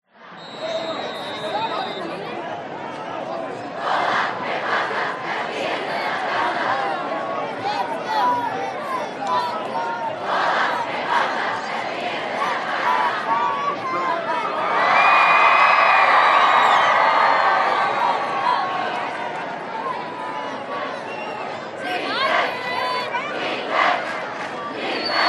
american football crowd shout scream cheer defense kids close by